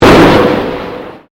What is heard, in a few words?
Convolution Pulse; Sound elements to create more complex sound effects; Swell Sound Effects; Sound Design